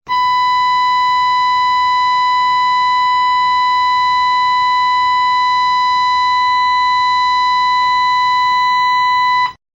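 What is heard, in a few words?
sound of alarm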